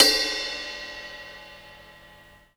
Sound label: long drum crash sound D key, Royality free crash one shot tuned to the D note. Loudest frequency: 4259Hz